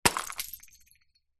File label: beer bottle full drop concrete ground smash; food drink; zapsplat